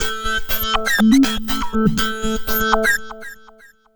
NRG Arp, trance

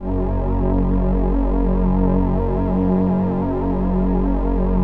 LAMBDA, String Machines

